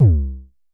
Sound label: Festival Kicks - C2, Kicks, Vermona Kick Lancet; LookIMadeAThing Kicks